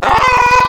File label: Chicken, Animals Wavetables; Cymatics; Serum; Animals for Serum - Gold Edition